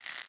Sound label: roman ranged bow charge; smite; npc; roman archer